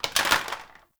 Plastic Drop; Warframe; Ambience; Nineteen Ninety Nine

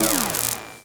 Sign Stop; Ambience; Props; Warframe; TheNewWar; Zariman

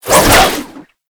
Whip Spin Whoosh; Oraxia Whip

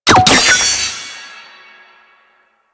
Sabotage Cell Destroy Activate; Warframe; Missions

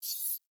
UI Lock On; Warframe; Railjack; Player Ship

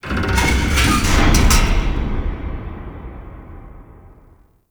Metal Scrapes And Hits, Asteroid; Warframe; Grineer

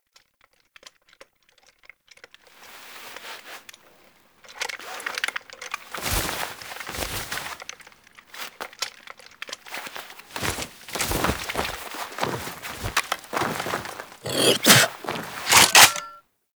Shotgun Foley Ending Get Up; Cinematics; Grineer Eidolon Shotgun; Kahl

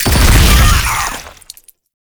Proto Infested Maggot Explosion; Warframe; Abilities

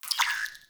Rail Jack Tech One Off

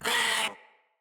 Kavat Vocal Pain; Warframes; Khora

